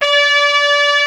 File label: TR G4, trumpets; East West - Global Sounds